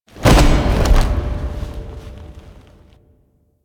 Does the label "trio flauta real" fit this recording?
No